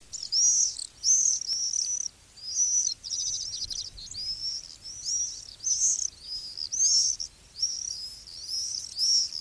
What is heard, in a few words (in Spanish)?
vencejo